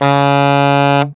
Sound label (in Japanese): アルトサックス時間逆転音 Db3; 持続音